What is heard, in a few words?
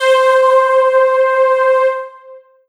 Roland SC 88 Halo Pad C5